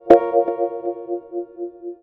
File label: harp